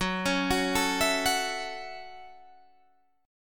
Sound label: F sharp Minor 7th F sharp